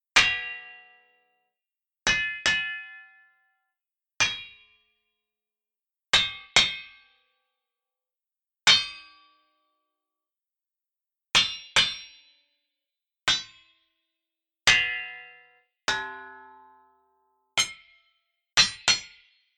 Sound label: anvil